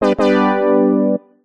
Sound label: game tone fanfare brass synth cheesy